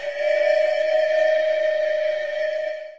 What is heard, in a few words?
train whistle